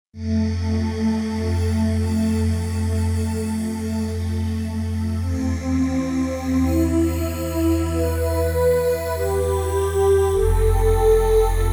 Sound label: New Age Vox, Roland JV-880; Factory Patches